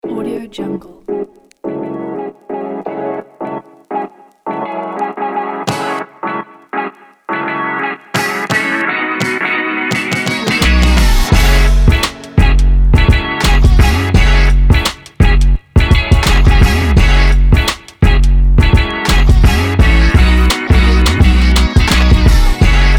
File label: hip hop